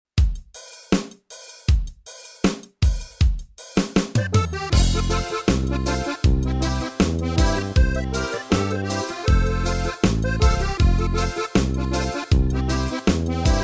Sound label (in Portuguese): Xote Gaúcho, Ritmos Yamaha; Xote Gaúcho 2